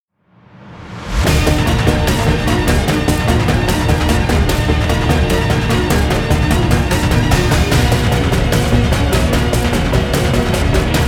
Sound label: guitar violin; By Burak Sound Bank